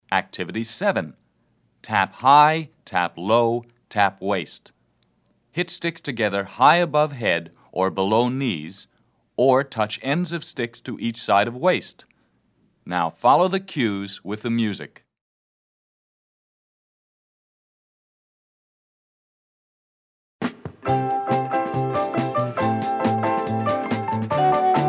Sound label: lively music for lummi stick fun